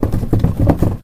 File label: cardboard box shake; physics; Source Engine; cstrike